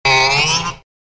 Twang

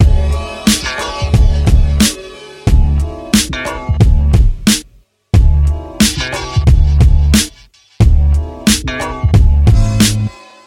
Westcoast Funk

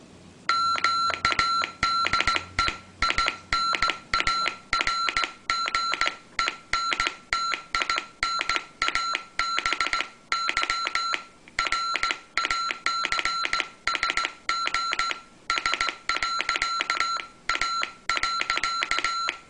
Morse Code

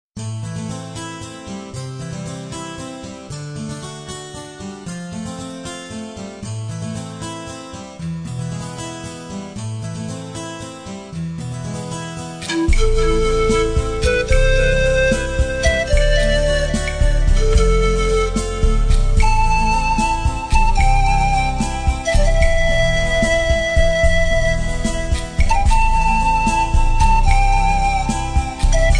Okarina

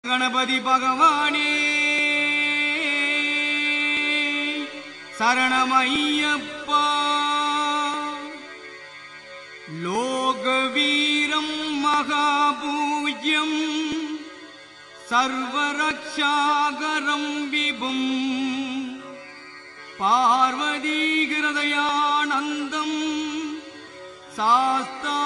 Nice Devotional Song

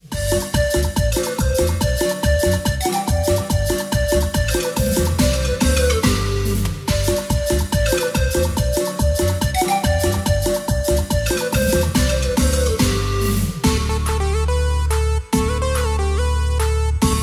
cumbia; Mercado Zelda

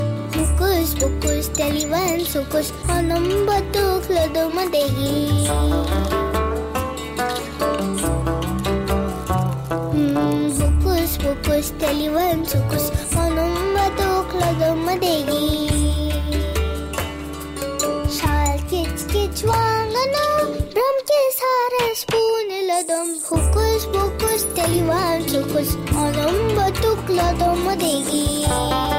Cute Child

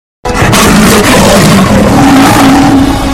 Roar

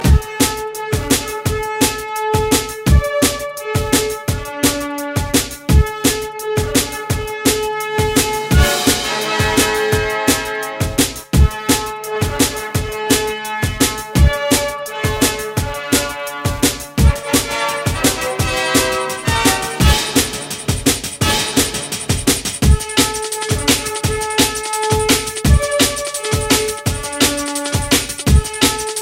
Dnb

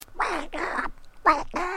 Ducky wake up

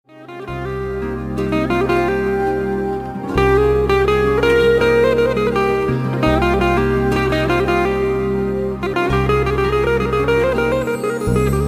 Turkish Music

